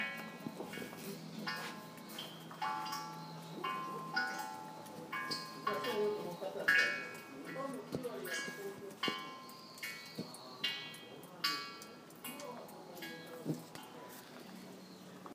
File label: Water Sound